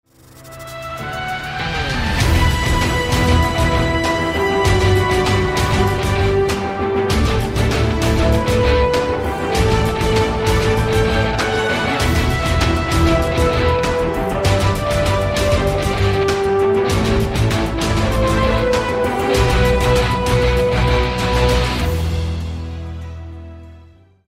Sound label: lobby